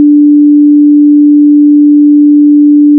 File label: Short Tone D